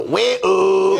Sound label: wee ooh